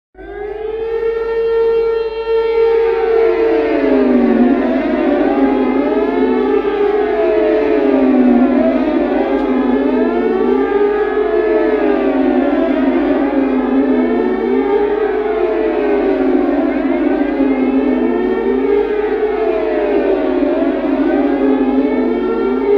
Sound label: airraid, siren